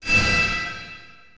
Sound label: PIPE HIT, construction; PC Gamer IT CD 40; zones